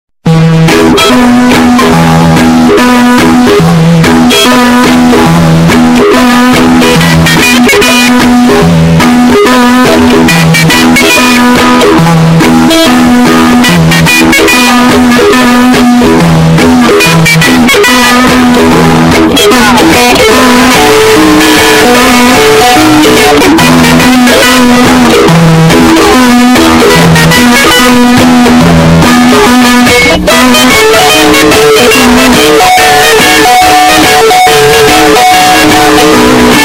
closing theme earrape; spongebob

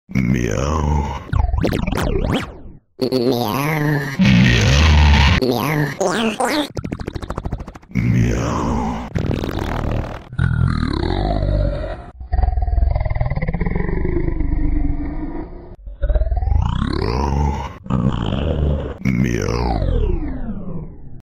Meow; Meow Man; MeMe

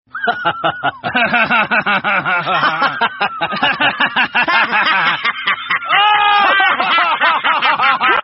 laughing; rick

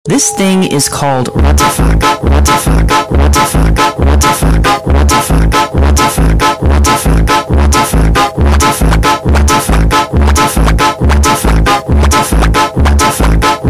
ratafak funk

